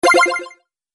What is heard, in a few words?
match found